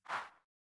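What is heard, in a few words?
Mac OS operating system sounds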